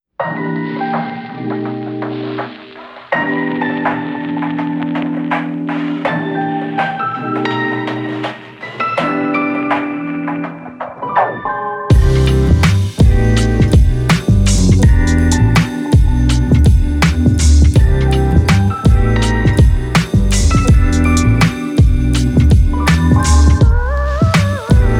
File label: Smoothjazz